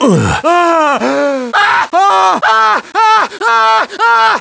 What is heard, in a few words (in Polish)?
krzyk